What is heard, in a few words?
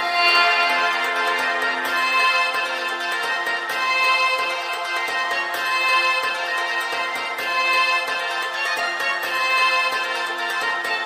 horns, Loops